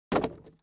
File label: close